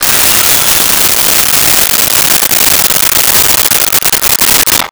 Circular Saw; Tools; 1001 Sound Effects

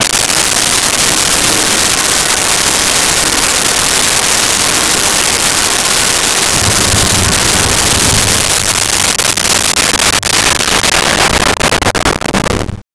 desert, Music

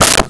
Wood 2x4; Tools; 1001 Sound Effects

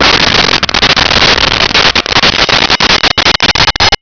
coin roll

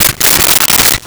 Soda Can Crinkle; Crashes; 1001 Sound Effects

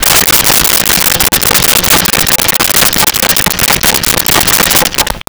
Plunger Rhythmic Metal; Vintage Cartoon; Foley

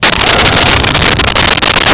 pod thrusters

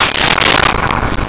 Hockey Puck Goal Post Hit; Sports & Recreation; SONY PICTURES SOUND EFFECTS SERIES

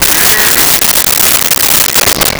Bronx Cheer, Comedy Vocals; Vintage Cartoon; SONY PICTURES SOUND EFFECTS SERIES

